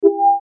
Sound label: Beep Tone; Multimedia Web Interactive; Beeps (Sound Effects:Multimedia; Blastwave; Technology